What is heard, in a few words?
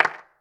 original hand clap sample f sharp key, Royality free clap single shot tuned to the F# note.